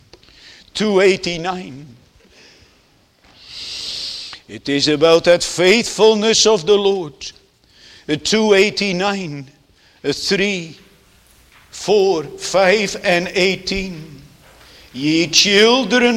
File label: Singing; Swearing an Oath